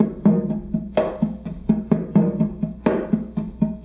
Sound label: Loop, rhythmate, classic machines